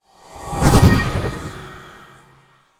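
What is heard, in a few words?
magic conjuration charge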